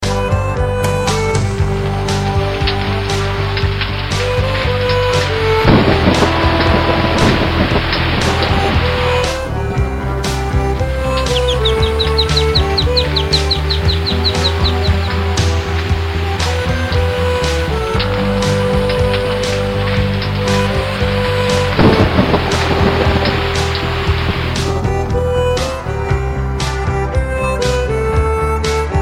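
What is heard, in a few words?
music of rain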